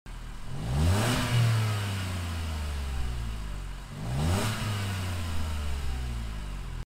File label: Kia, اگزوز ماشین کیا